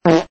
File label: short dry fart